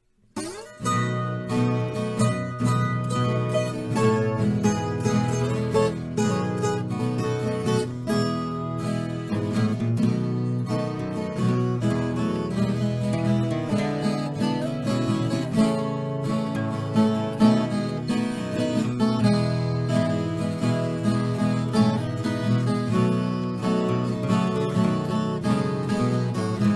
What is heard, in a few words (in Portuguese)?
trilha instrumental de viola caipira